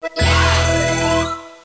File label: Fire; Fisher Price Ready for School Kindergarten